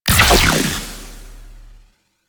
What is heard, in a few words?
Med Charged Shot; Warframe; Weapons; Corpus